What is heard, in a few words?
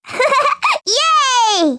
Yay; PiratePig; dora the explorer journey to the purple planet windows gamerip 2005